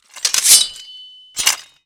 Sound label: metal